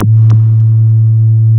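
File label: wall moog, bass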